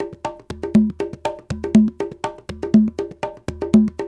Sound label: Soukous; Spectrasonics; Supreme Beats; African Contemporary